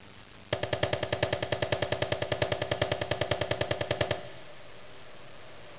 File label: TAPPING